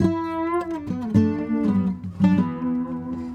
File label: Sarangi, Acoustic String